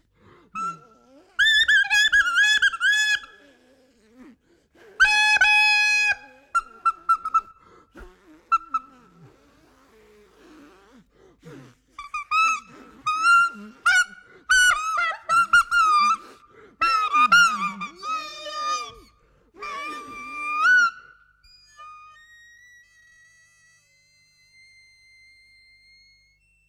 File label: clarinet